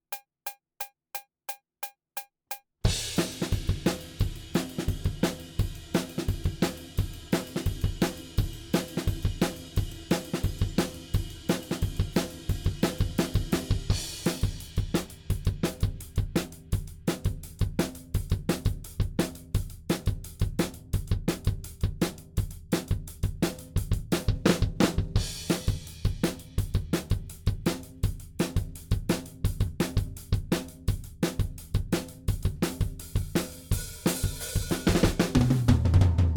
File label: Drums ONLY; Pre Pro